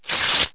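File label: quick tear; Wired for Sound Pro CD; Aristosoft, Inc.; rip tear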